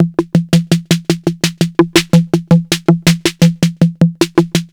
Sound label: 808 tape snare